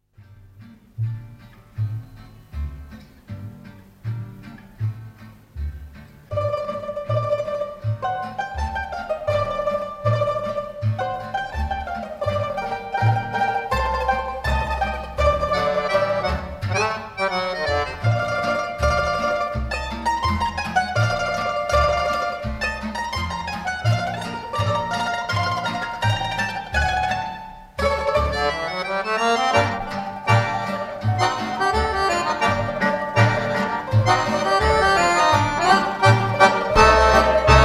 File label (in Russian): instrumentalnyiy ansambl